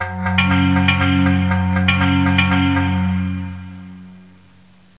Gamelan; WORLD

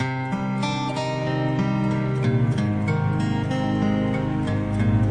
picked guitar power ballad; GUITAR LOOPS

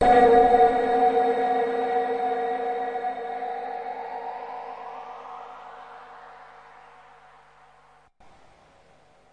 cold hollow hit; Atmospherics

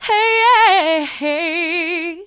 female melodic soul, female singing; VOCALS and SPOKEN WORD

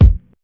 classic hh kick; Hip Hop; STYLE KITS; Classic Hip Hop Mega Kit

